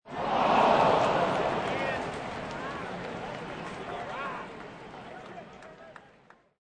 Sports Arena Baseball Crowd Cheer Disapointed

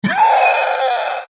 horror monster scream; Monster / Creature Scream; McKinney Sound

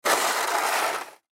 Snow Shovel Metal Scrape Hard; Yard Tools; Weather; Yard Tool Sound Effects; Blastwave

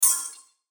Transition Whoosh Electronic Glitch Hit; Bright Short; Multimedia; Blastwave

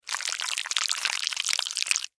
Slime Pour Horror, Slime Pour Horror Juicy; Monster Sound Effects; Foley

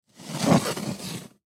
Pot Scrape, Pot Steel Pull Scrape Wood; Pot Stainless Steel 3 Quarts Pull Scrape Wood Surface; Pots and Pans; Blastwave; Household

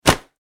Foley Towel Snap; Snaps; Objects

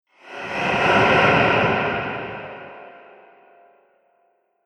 Ghost, horror sound effect

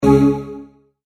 multimedia tone exclamation; McKinney Sound

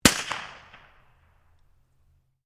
RIFLE CZ 452 VARMINT 22 CALIBRE FIRING; A selection of domesttic firearms; Rifles; Guns

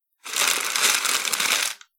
Parcel Wrapping Paper Scrunchy Noise; Foley; Andrew Fleming

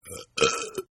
Burp; Burping; Human

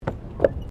Tram Horse Drawn Step Wooden Bridge

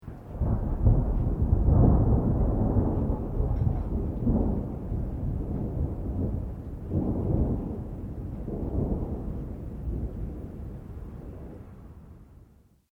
THUNDER DISTANT LOW LONG; Elemental weather effects

